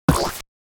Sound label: Hologram interface tiny glitch